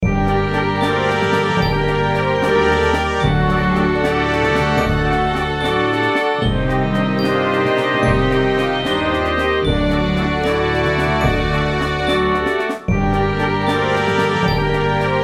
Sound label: Short Orchestral Thing